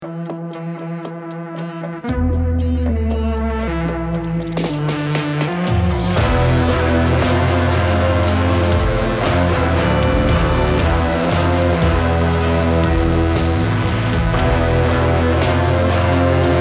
trip hop

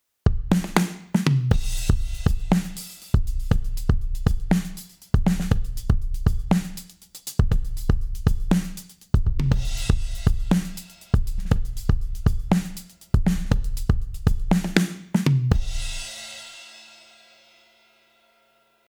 Drumloop Feedforward; RND Portico 5043

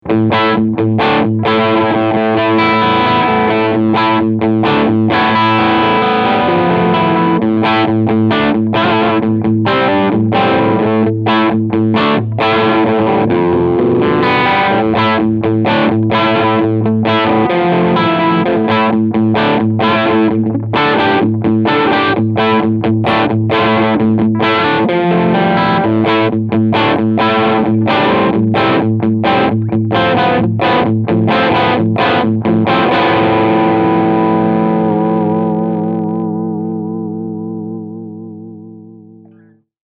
Jackson Audio El Guapo Distortion AMBER

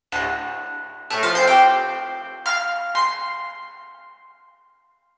Sony DRE 2000 Modal Reverb

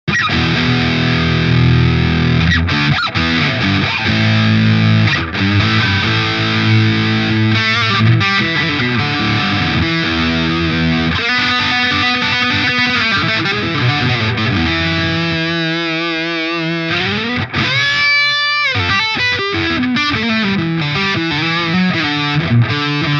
VS Audio Aftermath max. Gain

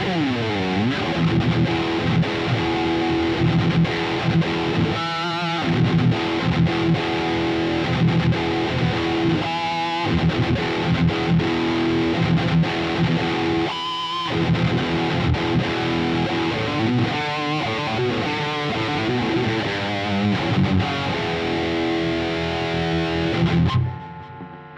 High Gain Line

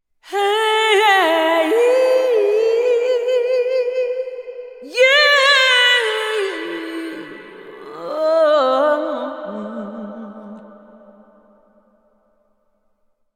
Voices of Soul Large Hall

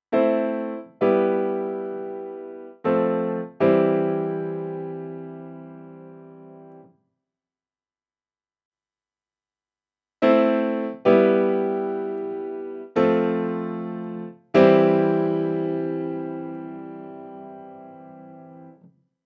Grand Pultec

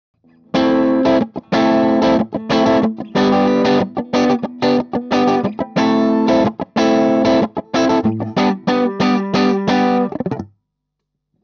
clean gain

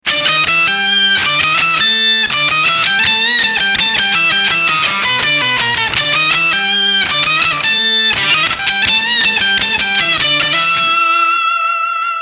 trike

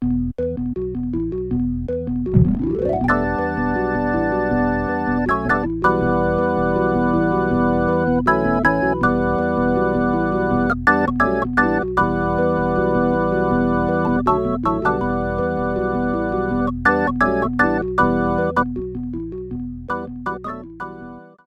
Organ

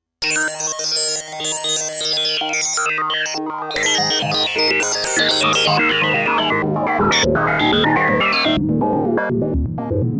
JP8 Random Dual

